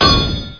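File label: metal to stone; Hexen2; weapons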